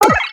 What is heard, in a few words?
Imagination Computer; spaceship